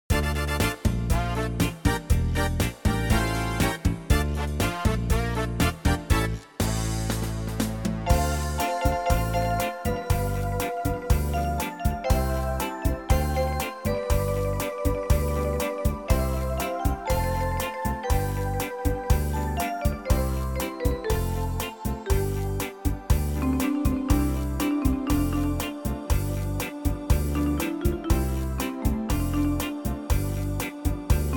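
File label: Yamaha Klavier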